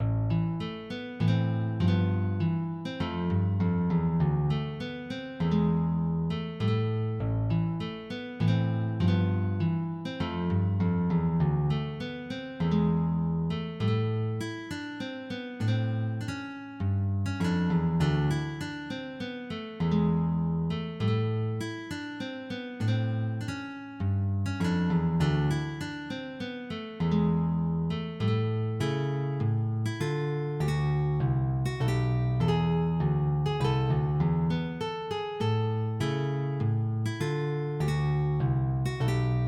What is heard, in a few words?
Chaconne, MIDI